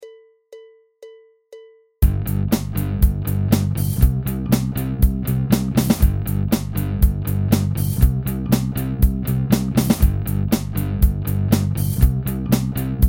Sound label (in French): debutant groove